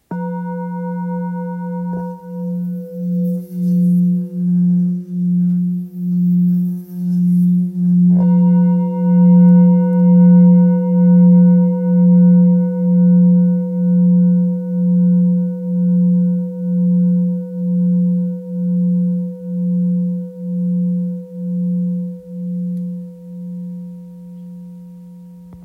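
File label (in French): Ancien Si 690g, Bol Chantant Tibétain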